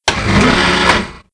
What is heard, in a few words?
Lift Doors; Playable Tutorial Level